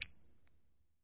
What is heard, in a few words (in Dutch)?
deurbel